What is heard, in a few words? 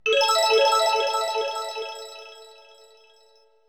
Barrel Course Complete; Donkey Kong 64